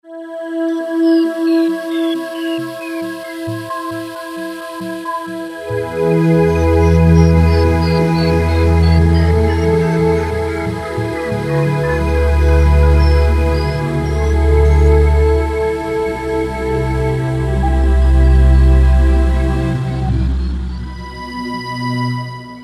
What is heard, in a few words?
vox, E-mu Orbit V2; StarFall